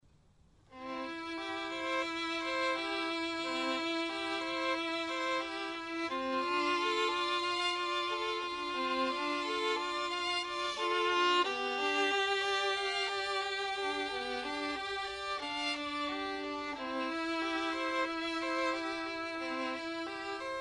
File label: ave maria duet, violin; bach-gounod